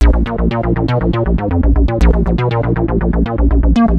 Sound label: A#1, Synth Line; 90 s Sample CDs; NiceBeats; SynthLine VOL-18